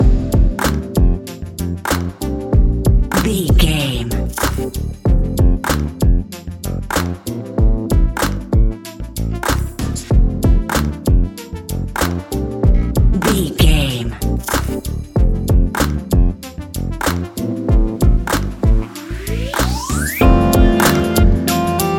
late night lullaby, A relaxed and chilling piece of music